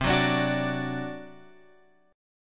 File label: C Suspended 2nd Flat 5th C down Guitar Open F; Csus2b5 strummed